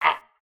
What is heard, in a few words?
hurt